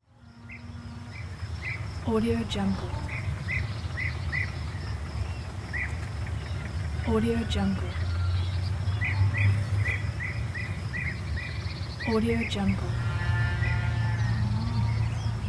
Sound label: nature sounds village